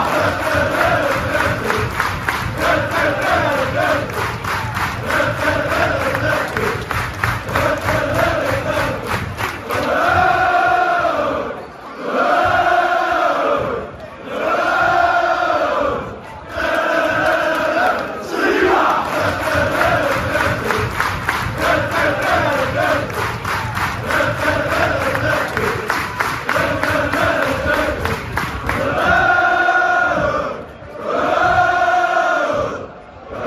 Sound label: player chant, fan chants; william saliba; arsenal